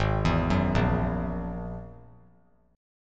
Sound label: Ab 7th Suspended 2nd Sharp 5th, 3C,3F,3A,3D; Ab7sus2#5 arpeggiated; JGuitar